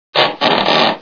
creak loud; ambience

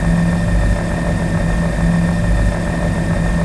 machinery

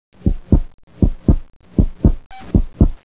xenome heartbeat

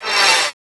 ammo respawn; ambience

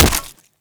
crowbar hit, impacts flesh; weapons

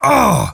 pain; player

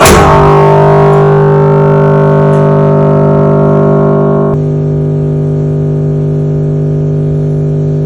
switch on loop; mesa facility

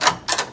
toggle, doors

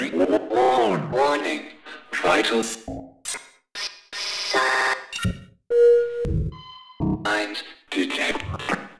hev glitch

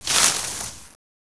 brush movement; ambience

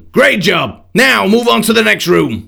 stage complete; ff training

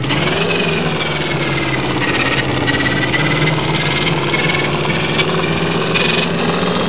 weird machine

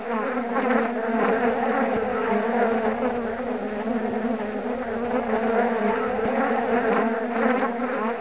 western fly loop; ambience

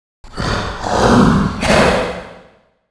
growling

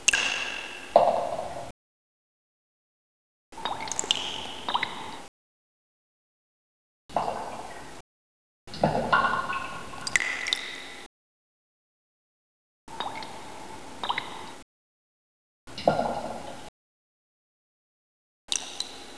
load drips

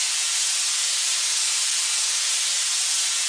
gas steam

